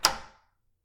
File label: turn classic key